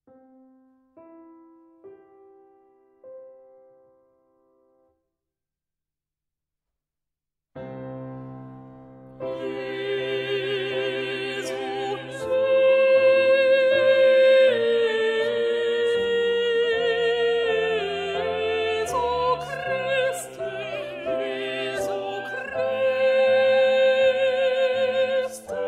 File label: jesu christe alto